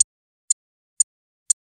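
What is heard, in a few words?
dramatic countdown ticking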